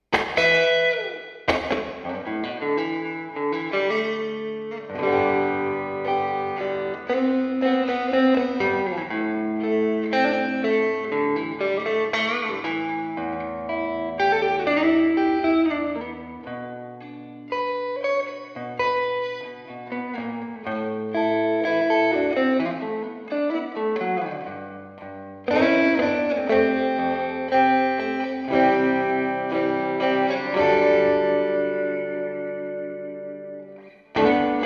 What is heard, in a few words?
strato cranch, tweed deluxe